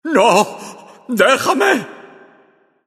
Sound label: river spa; God of War Collection Volume 2